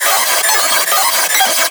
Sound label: Bleep, bass